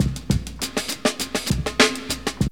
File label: another version, breaks